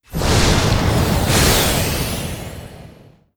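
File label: Battle Start Transforme Gold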